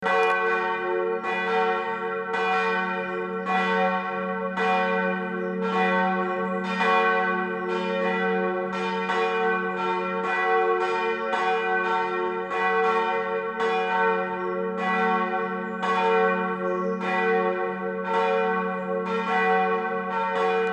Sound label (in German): Karmel Glocken